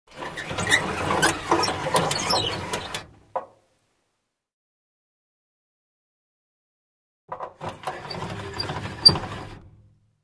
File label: Car glass lowered, raised manually